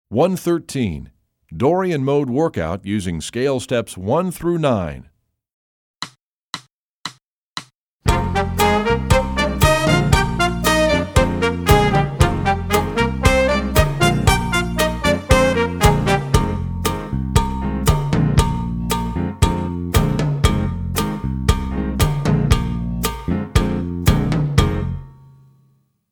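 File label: dorian mode workout scale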